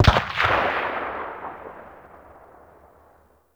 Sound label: Weapon Enemy Tail Outdoor; Weapons; Warframe